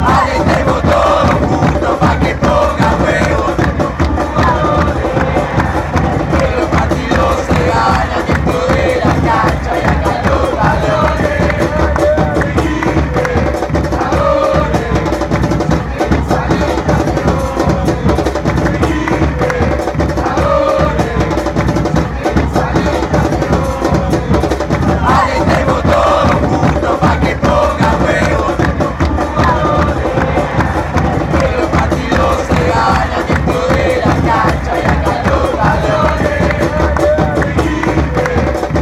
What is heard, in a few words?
well all be cheering, fanchants